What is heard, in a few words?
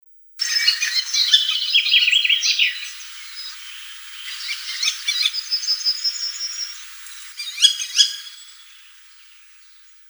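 Levant sparrowhawk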